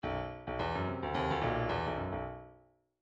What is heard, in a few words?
midi grooves funk